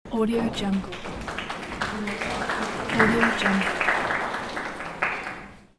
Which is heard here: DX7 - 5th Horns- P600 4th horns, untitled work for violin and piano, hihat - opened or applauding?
applauding